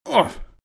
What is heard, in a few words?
hurt; RadwinIsland